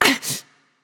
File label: ouch; smite; aphrodite